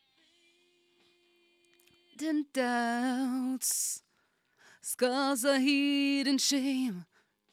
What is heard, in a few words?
Vocal